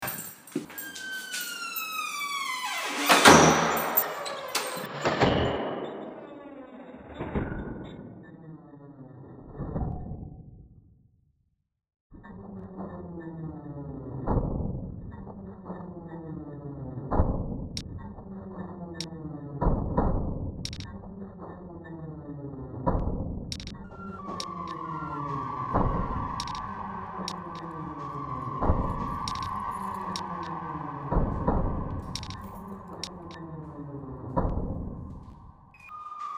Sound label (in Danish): at komme hjem